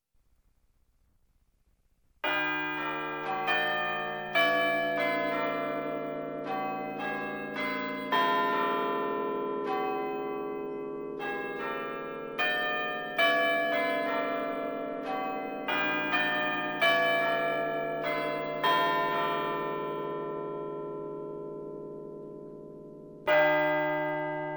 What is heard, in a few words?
guildford chimes, holy trinity church